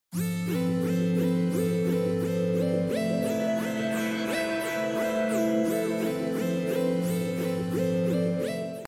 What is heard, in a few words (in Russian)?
melodija na sms